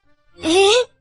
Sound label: giggle